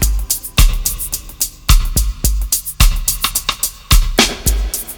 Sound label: DRUMLOOP, Ragga & Dub Reggae